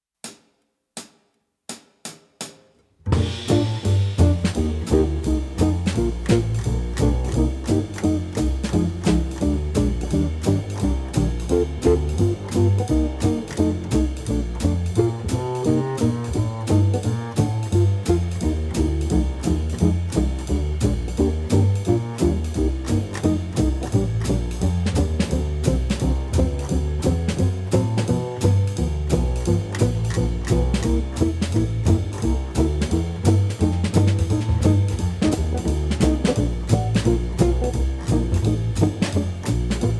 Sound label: Rhythm Changes no piano